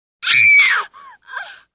Screams Woman Single Scream Close Perspective; Human Sounds

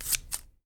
SCISSORS

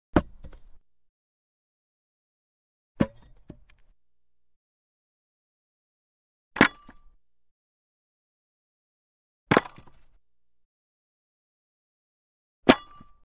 Axe Falls To Ground Dull Axe Fall On Ground; Hollywood Edge Foley Sound Library

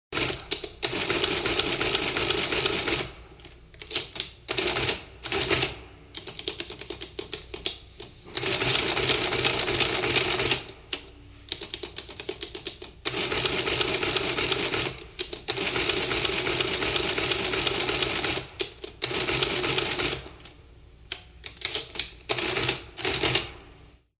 Electric adding machine; SOUND EFFECTS1

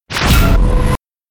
impact

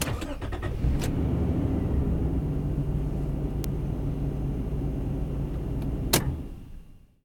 engine start; 1000fx

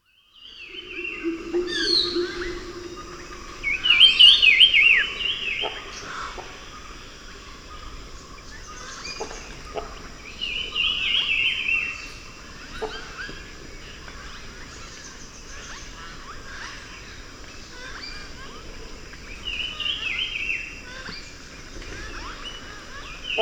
Deep In the Jungle; Dr. Sound Effects; 210 Nature Sounds - 20 Hours of Relaxing Natural Ambiences for Meditation and Sleep

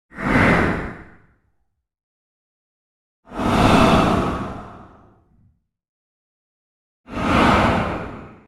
Whoosh - Fast breath by x3; Hollywood Edge Sound Designers Tool Kit

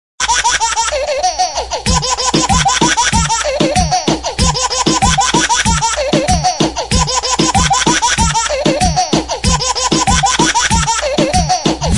Funny Baby Ringtone

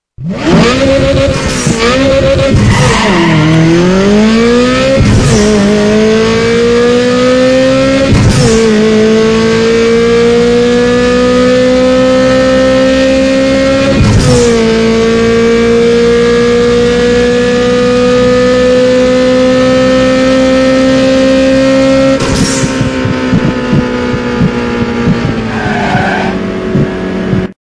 Car Sounds Ringtone

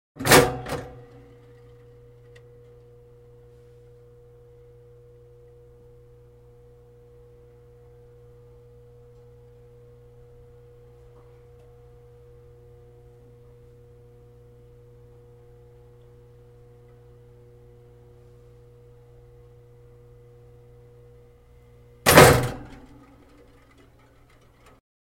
Electric Toaster Bread Down Toast & Bread Pop Up; Household

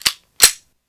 load, gun shots; 1000fx

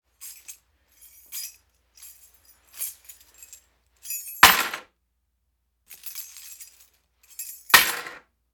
Key Toss to Wood Table; Foley - Props; Foley Essentials

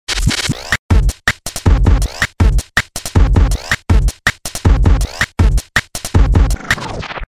Techno Bounce Groove; DJ sounds; Mtrax

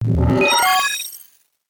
tones

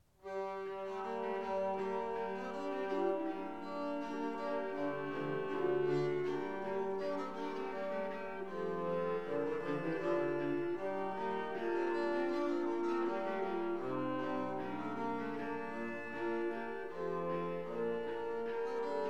Instrumental

